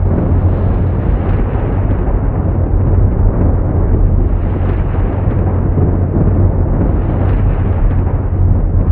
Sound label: tunnel